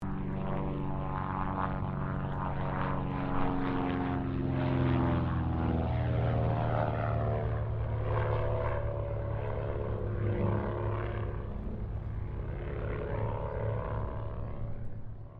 AIRCRAFT CESSNA O-1 BIRDDOG PASS DISTANT, spotter aircraft; Airplanes